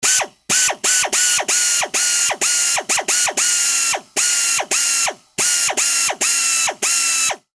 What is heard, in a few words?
pneumatic drill